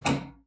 PLOK F5, spinet; Xsample VOL-7

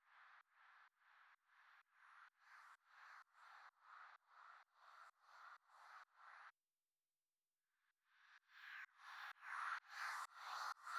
Drum Loop FX Noise, 128BPM; VEC5; Drumloops; Multi Loops

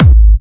Trance Kicks, Bassdrums

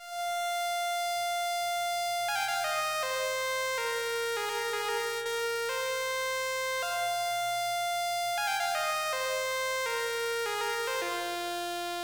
syn Ab, Hip Hop & RnB; Black Butta

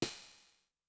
Cym; Sonic-Potions LXR; DocWire

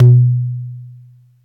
DUMBEK, ETHNIC PER; AKAI S-Series CD-ROM Sound Library VOL-5

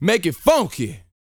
VOCAL F; ILIO

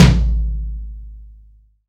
BASS TOM

